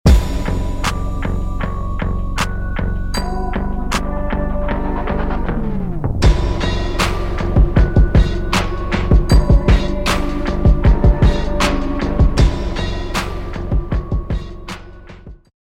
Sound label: start action, music